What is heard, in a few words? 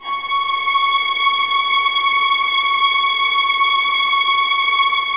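2nd violins sustain c#6; Sonatina Symphonic Orchestra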